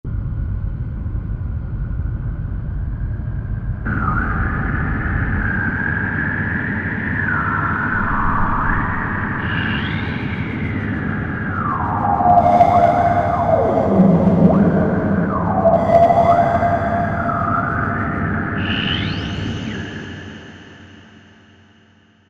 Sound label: Mothership, Special FX; Roland SR-JV80-15 Special FX Collection